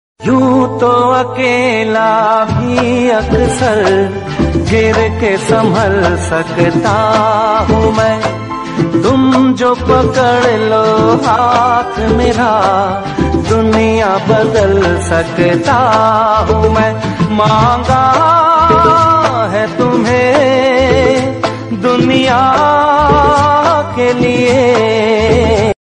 Old Hindi Song

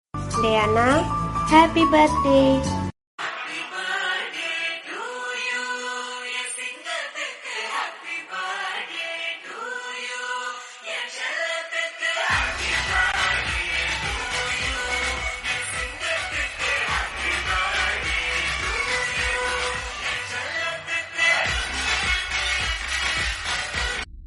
Happy Birthday Tamil Song

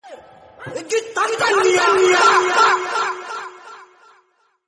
Message Tone; ringtone

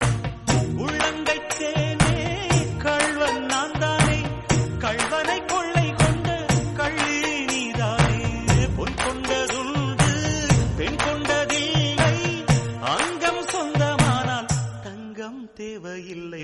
Song Tamil Ringtone; Gentleman

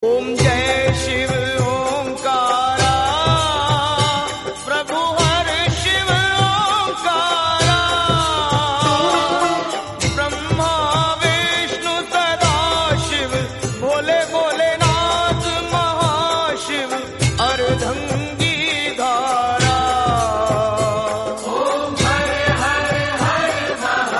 Shiv Aarti, Hindi; Om Jai Shiv Omkara

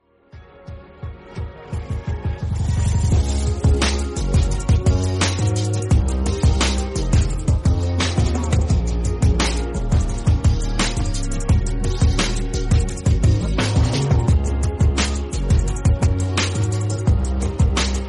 Bgm Instrumental

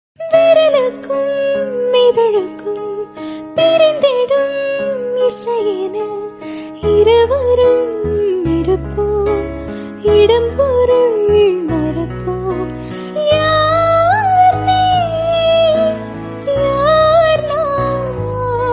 Female Song Tamil

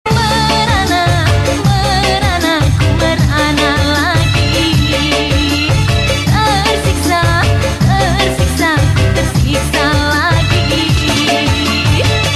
Ringtone

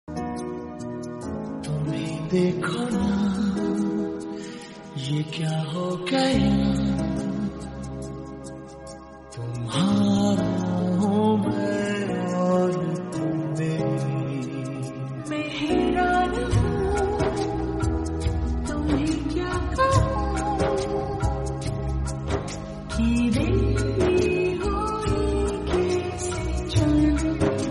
Romantic Hindi

